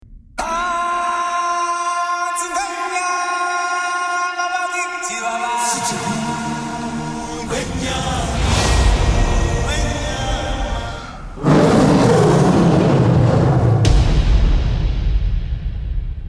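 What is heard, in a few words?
3d sound; lion king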